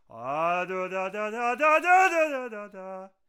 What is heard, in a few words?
Divine Arbiter Attack Vocals